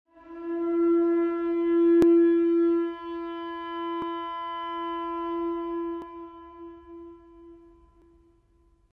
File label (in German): Muschelhorn